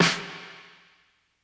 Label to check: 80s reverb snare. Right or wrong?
Right